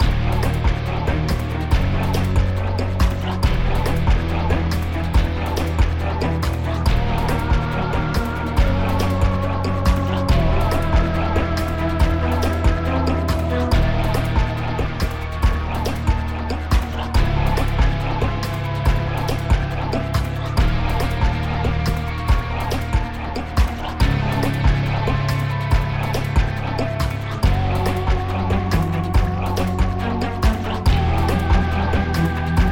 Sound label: Swamp; Apocalypse Max: Better Dead Than Undead